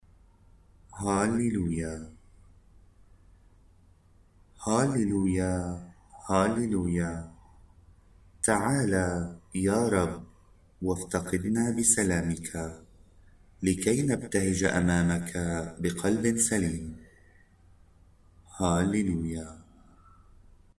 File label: Halleluiah, arabic mass